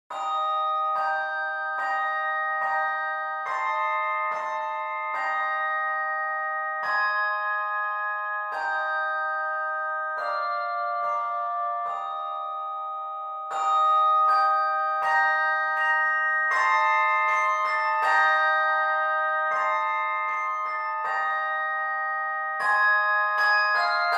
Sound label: Rocking Carol, handbell